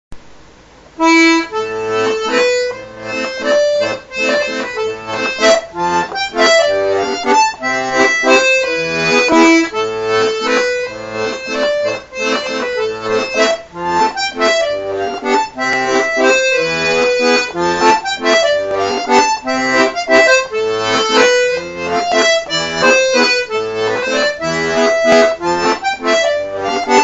VALSE

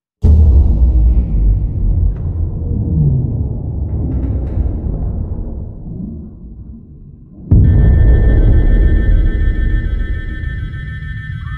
Alarme; SCP; Brèche de SCP-106

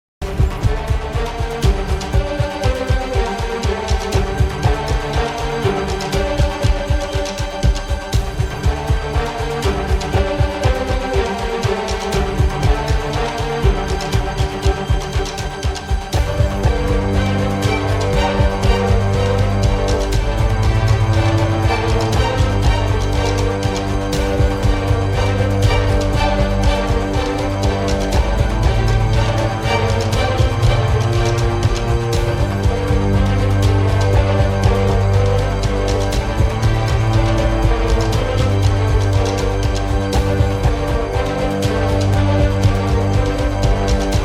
Musique d Action Mystérieuse

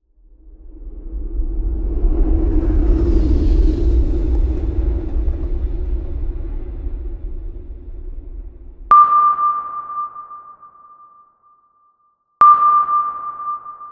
Ambiance espace

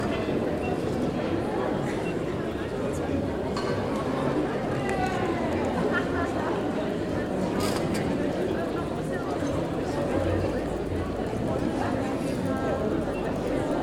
rue pietonne

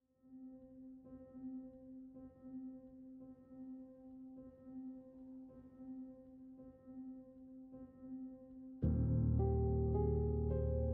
Ambiance pesante

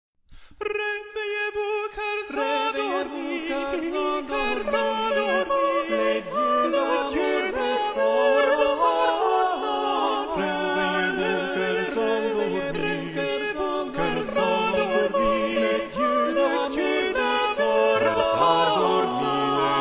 Le chant des oiseaux; Clément Janequin

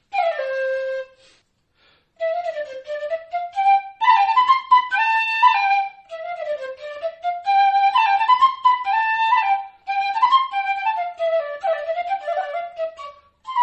Instrument

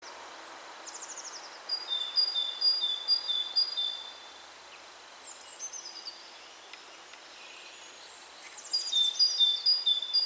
anti stress music bruit de la nature

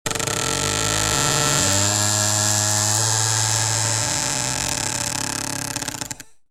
bruit grincement de porte

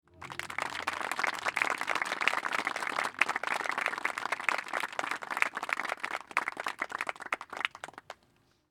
Applaudissements 25 50 pers

